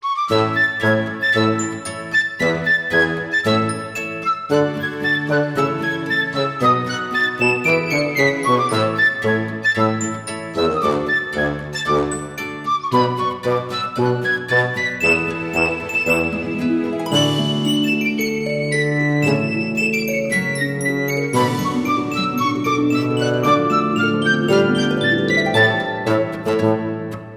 music system menu

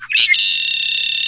Bird

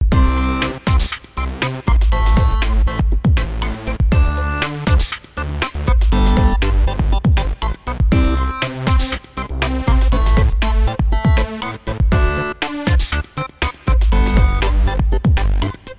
Dance; Talking Pato; Android iOS

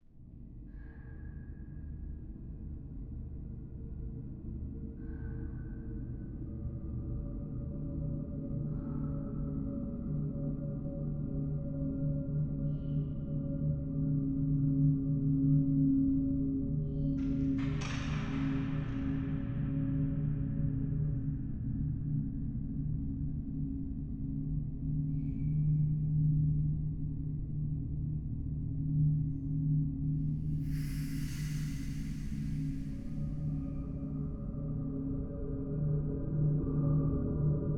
Catacombs ambience; Grimshade

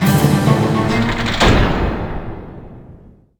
Fanfare Show Down; Rocket Knight; PS3

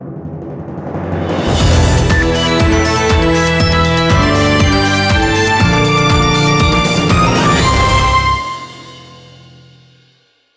system se win; superdimension neptune vs sega hard girls; ps vita windows

